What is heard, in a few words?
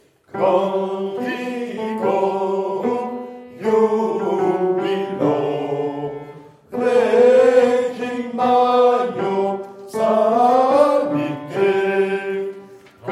basses